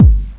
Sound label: BDRUM; Descent II